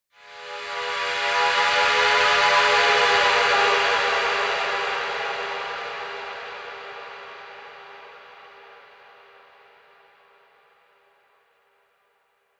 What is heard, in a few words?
erratic cry high, drones